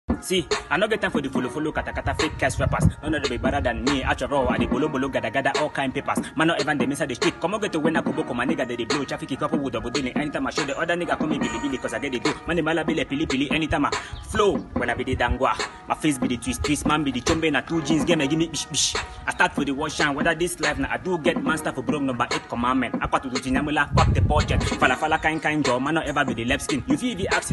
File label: Freestyle Instrumental